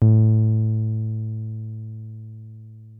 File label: 303 A2, TB 303, Synthesizer; Hollywood Edge; Giorgio Moroder Rare Synthesizer Collection